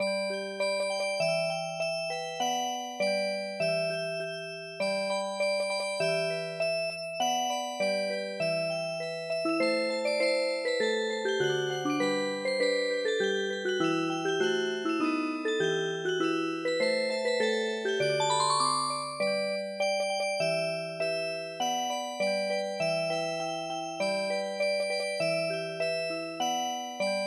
March Music Box; The Nutcracker

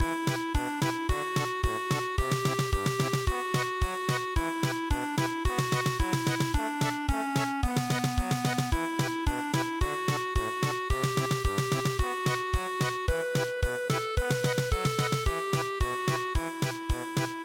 toritachi no inori polka

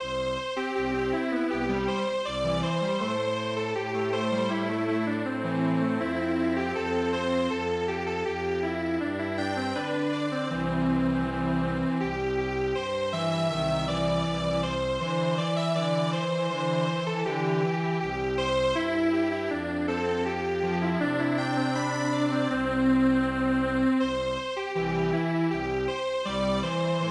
Strings Quartet, traditional; The Hill That Is Like a Gold Vase

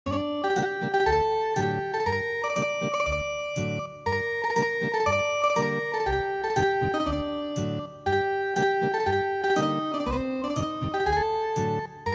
Ethnic Guitar

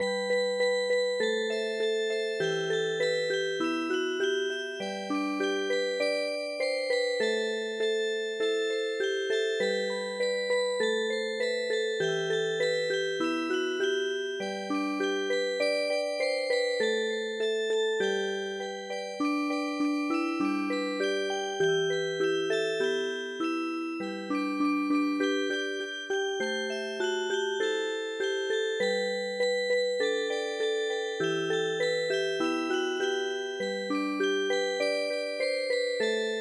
Music Box, midi traditional; Waltzing Matilda

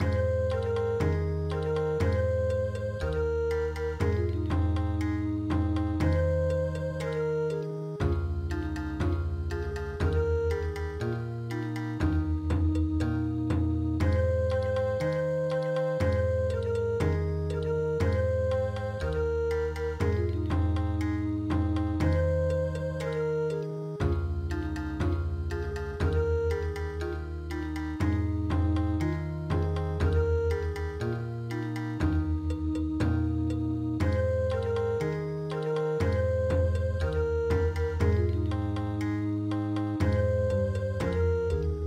Bon Odori, midi

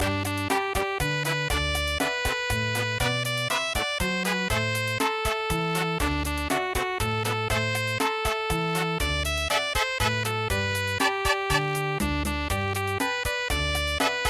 chachacha; La Cucaracha

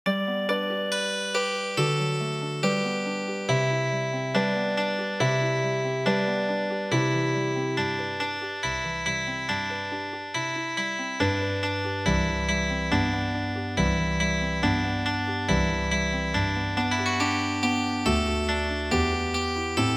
Healing Harp, traditional; The Returning Hunter

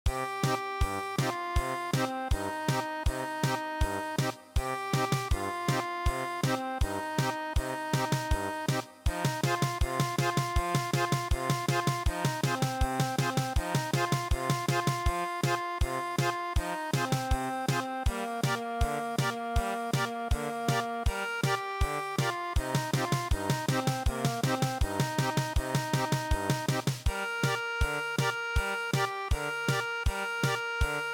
German Polka, midi; traditional

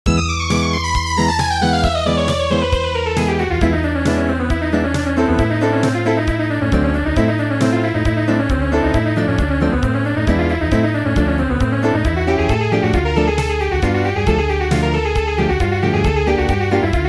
Acoustic

